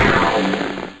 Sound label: Yggdrasil Damage